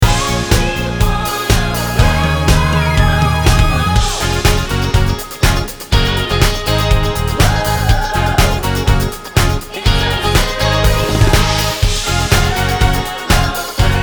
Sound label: Ab m Piano m Backings